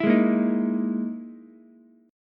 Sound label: E Major 7th Flat 5th, Ukulele; EM7b5 strummed; JGuitar